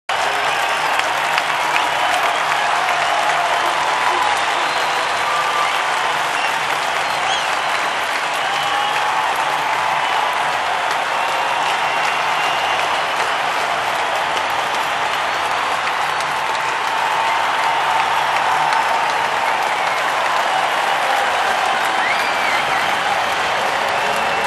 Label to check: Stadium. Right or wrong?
Right